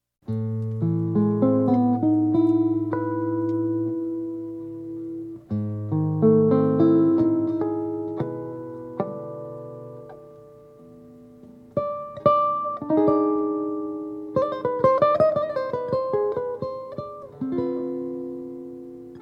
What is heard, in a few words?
Intro Solo Guitar; Kaleidoscope